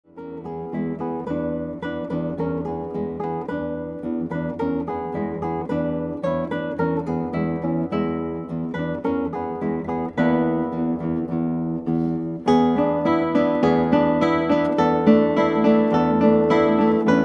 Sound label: Guitar